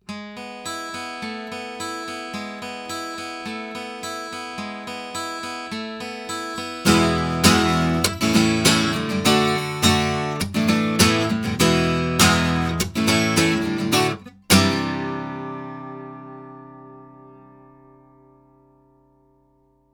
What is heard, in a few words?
guitar Gibson; Milab LSR-1000